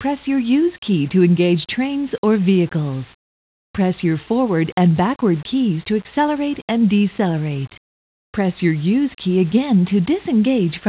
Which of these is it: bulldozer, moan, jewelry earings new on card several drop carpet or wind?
bulldozer